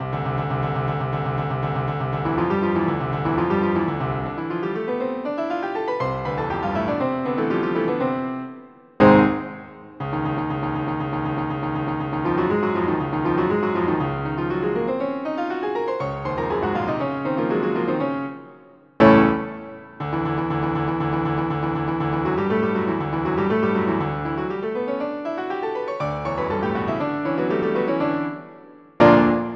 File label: pianists; Massive MIDI Collection